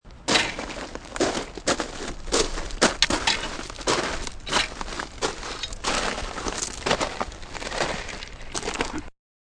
Footsteps Gravel, Medium paced walking of boots on gravel; Footsteps on Gravel 1; Human